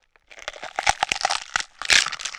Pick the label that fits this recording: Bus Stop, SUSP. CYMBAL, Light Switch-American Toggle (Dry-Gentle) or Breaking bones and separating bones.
Breaking bones and separating bones